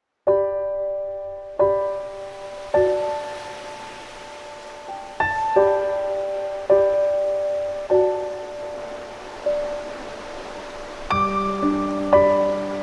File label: Piano & Sea